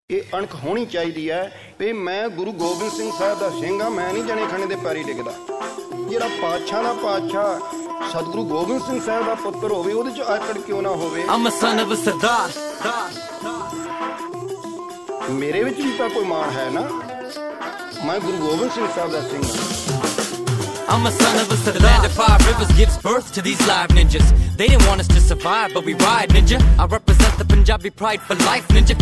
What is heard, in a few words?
Punjabi